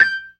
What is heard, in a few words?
PERC, Synth and Organ; InVision Interactive; Keith Emerson; The Most Dangerous Synth and Organ; CLASSIC C3 4